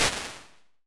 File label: Guitar Chamber, Impulse Responses; Small Spaces; Indoor Spaces; Apple